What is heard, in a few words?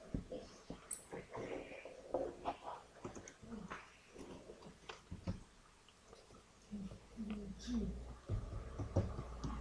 sharpen